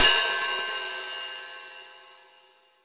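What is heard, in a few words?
open hi hat, 14 inch old hi hat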